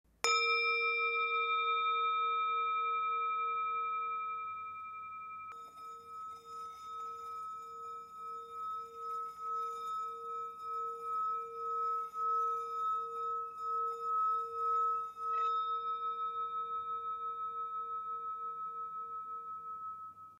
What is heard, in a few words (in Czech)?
tibetska misa